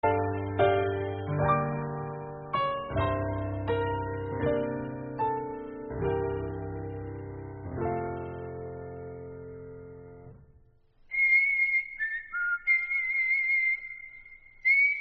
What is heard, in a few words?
Whistle Song